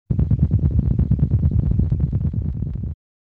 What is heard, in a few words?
Sound of Pumping Out a Rock; Dragon Breed